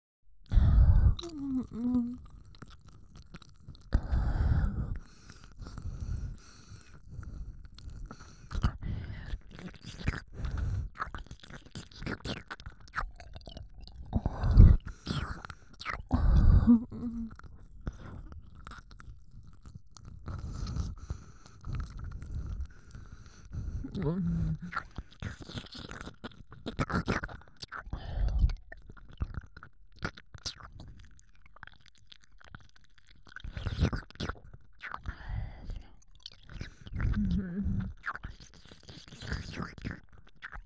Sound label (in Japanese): 耳舐め, 真面目系OL